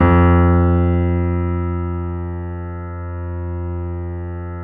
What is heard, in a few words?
BOSENDORF